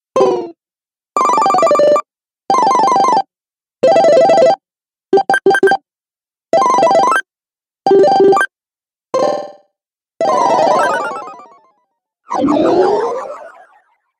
Retro Videogame Fx 10 Sounds Pack

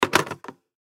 Telephone Hang Up; Electronics; Office; Telephones; Blastwave

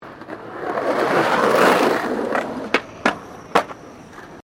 SKATEBOARD FLAT JUMP; Skateboarding; Sports

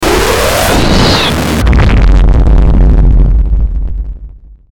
Stinger Power Up; Stinger Power Up Distortion Hit; Stingers

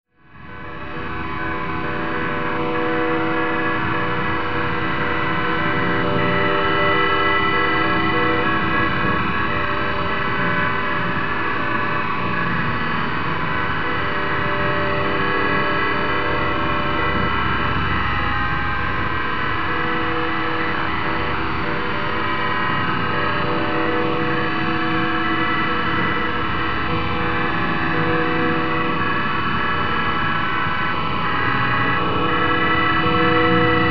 Drone Dark Filter Haunting Data Transmission; Blastwave

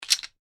toy bricks wooden movement; Miscellaneous Toy (Sound Effects:Toys); McKinney Sound

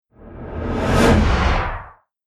Cinematic Reverse Whoosh; Whoosh Sound Effects

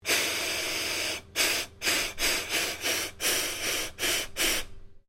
Ships horn broken; Boats; Vehicles; Sounds onboard HMAS Castlemaine

